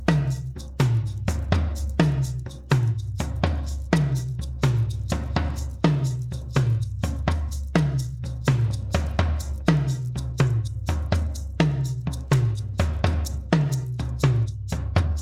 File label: pattern intro, drums